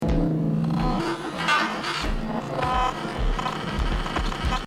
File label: Kick